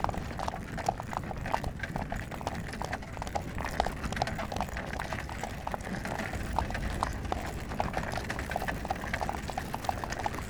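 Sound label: loop chariot movement; Battle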